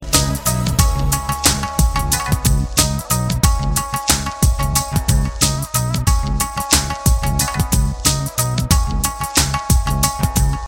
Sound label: tumbi scratch